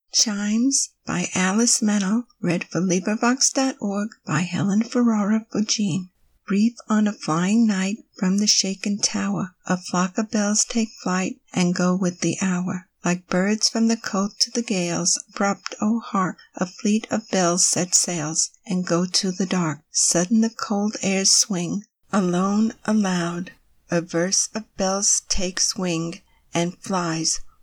chimes; meynell

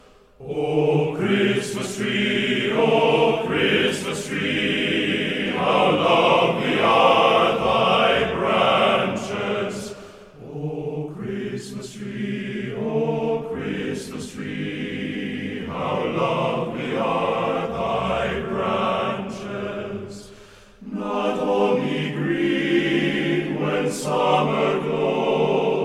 o tannenbaum english us army band

